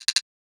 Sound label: percussion oneshot trickle; Pop; Drums; SD PASADENA Pop Sample Pack; Slate